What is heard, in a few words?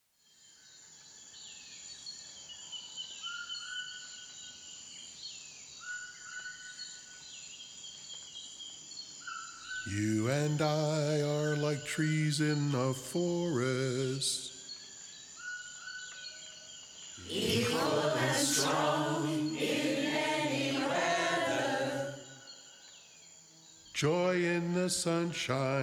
Acapella in the rainforest only vocals